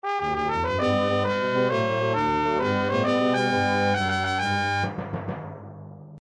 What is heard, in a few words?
Super Nintendo stage clear remix, midi; Plok; Ssbm